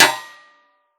bullet hit shield; physics

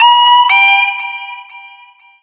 test chamber pos, buttons; Portal 2

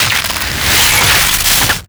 shockwave rise

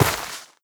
step, footsteps player grass

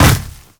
land, footsteps, player, mud

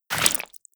debris flesh, bullet impact debris

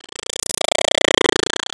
warning, headcrab poison; npc

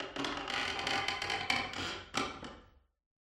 ambient wood board creak

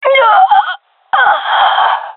death, gendarmerie fem; vo

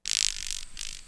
buzz, forest; ambient; Half Life 2; Episode 2

